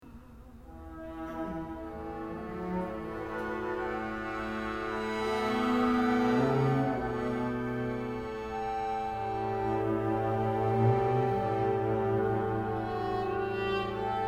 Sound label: String Suite